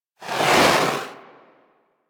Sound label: Heavy Grenade Launcher Projectile By; Warframe; Archwing